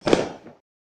bang; bang sfx

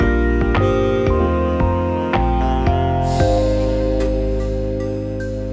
Triplet Unison; BB 2HTC Samples Vol 4 Addendum; Weird

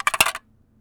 Small Crushed Can - Clatter; Micro Pack

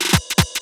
Little Fills; BB 2HTC Samples Vol 4 Addendum; Weird

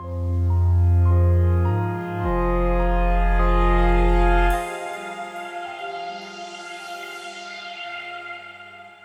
Full Bass; Solos

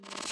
Riffle Build; BB 2HTC Samples Vol 4; Weird

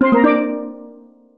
jingles STEEL; music jingles; kenney

